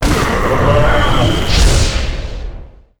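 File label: ghost death, monster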